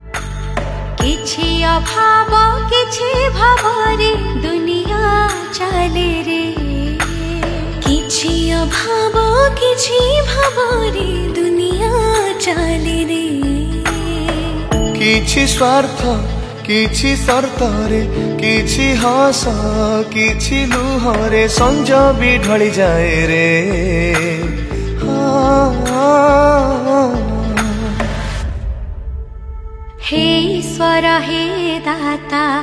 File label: Odia Bhajan; Ratha Yatra